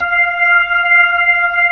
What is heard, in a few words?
B-3, Jazz